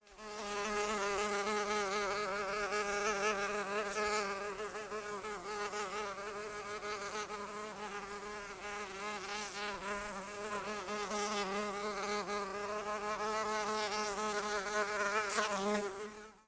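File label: sound of a large fly buzzing indoors; nasekomyh v prirode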